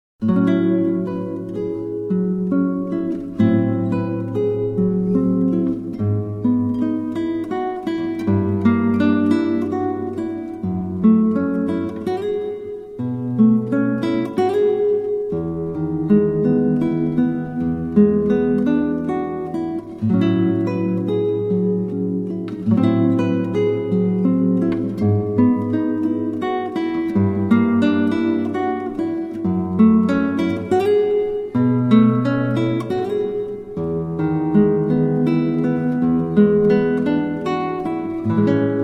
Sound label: Guitarra; Gitaarboek